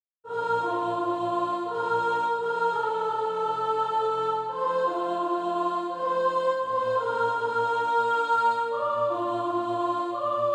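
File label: soprano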